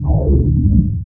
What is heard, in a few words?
gravity pre delay; Earth and Beyond; EA Games